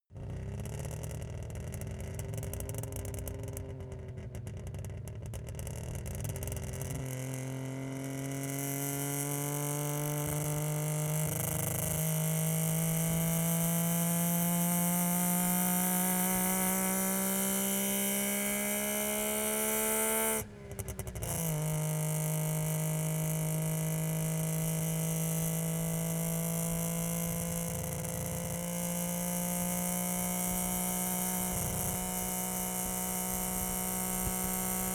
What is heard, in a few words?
car saab 92 accelerate slow exhaust